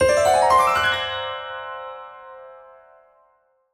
Mystery Arp; soundscrate

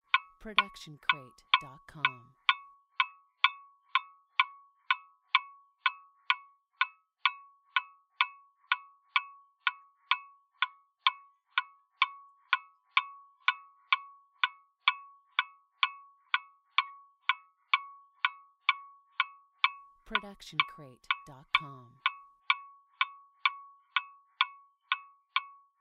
ticking clock; soundscrate

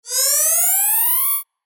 scifi weapon power up high

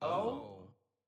audience oh

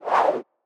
woosh

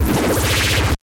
stylized glitch sound; soundscrate

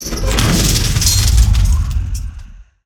metal beast; soundscrate

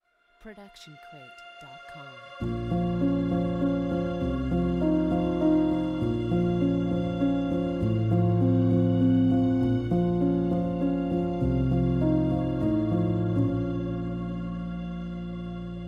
Mystery Piano; soundscrate

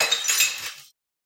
Glass Break; SoundsCrate

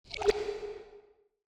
water swell; soundscrate

